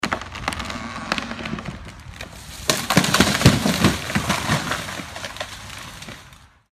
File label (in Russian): skrip i padenie dereva